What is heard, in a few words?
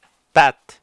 bat